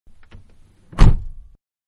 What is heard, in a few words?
Small Car Door Close Interior; Vehicles; Cars; Manhattan Production Music